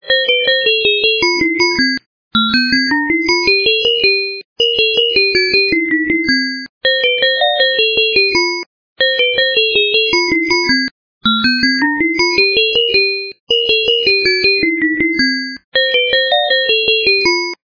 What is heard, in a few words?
mex hat dance